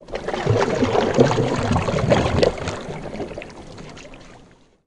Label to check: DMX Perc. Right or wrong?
Wrong